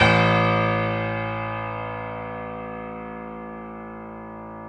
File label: PNO, Grand Pno; Club-50 - Foundations Roland